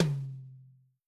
Mid Tom

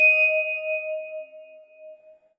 vibraphone D#6

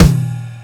drum hit finish; osu

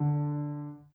Piano Chord

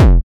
kick distort

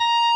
solo

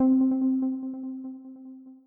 EPiano

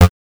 Leed EDM Short F

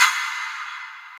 tock; osu

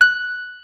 piano

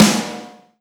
SNARE

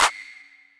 soft hit clap; osu

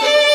violin; osu

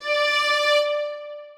Strings kiai

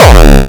Dark Kick

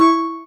soft hitwhistle; osu

